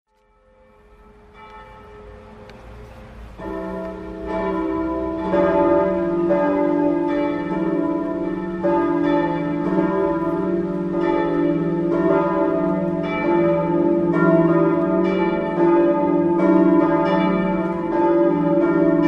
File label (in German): glockengelaeut halle johanniskirche